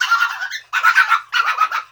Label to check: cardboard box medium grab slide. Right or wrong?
Wrong